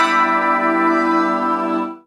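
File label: synth pad chord; Best Service Gigapack 1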